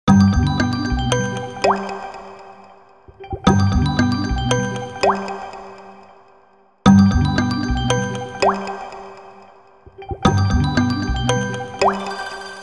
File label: Incoming Ring Tone; konnektedstudios